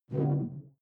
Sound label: sci-fi hud refresh; maschinen; signale; soundpool